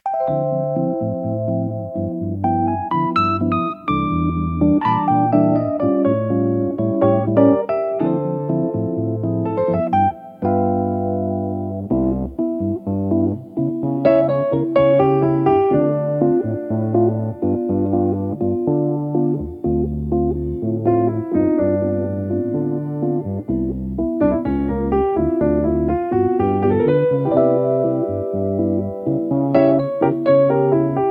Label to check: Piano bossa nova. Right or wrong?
Right